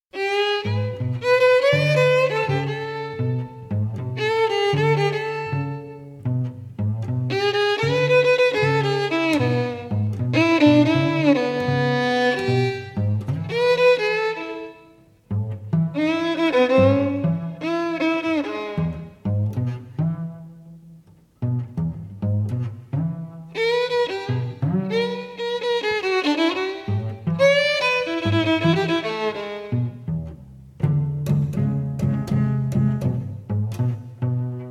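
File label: String Quartet Country Blues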